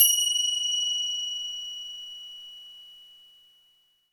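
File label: finger cymbal; ILIO; Synclavier Percussion 2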